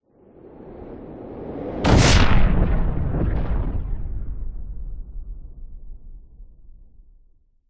science fiction explosion passing spacecraft blow up; zapsplat